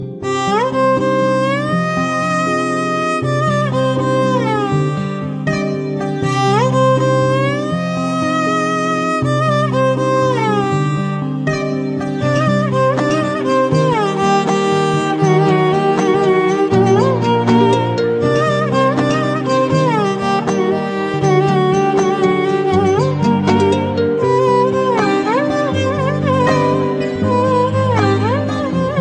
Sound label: violin; Kumki